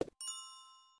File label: ding